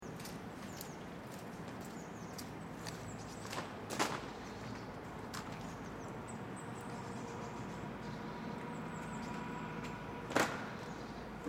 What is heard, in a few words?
Residential Area Birds Calm, Calm, ambient enviroment from backyard in residential area; Footsteeps and light birds; Airplane passes in the distance